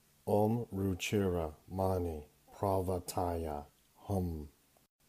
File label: Mala Blessing Mantra